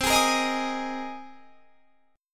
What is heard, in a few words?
C Minor 7th Sharp 5th C; Cm7#5 strummed; 20,23,20,20,21,23